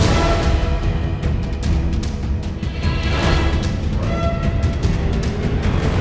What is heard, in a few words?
Battle Loop